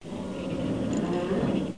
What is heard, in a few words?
oil pump; Mr. Wonder's Greatest Toyshop on Earth; OmniMedia